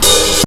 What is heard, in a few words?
HH FOOT; USB Soundscan vol.27; Ragga & Dub Reggae; AKAI